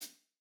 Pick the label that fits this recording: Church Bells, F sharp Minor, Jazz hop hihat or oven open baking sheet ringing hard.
Jazz hop hihat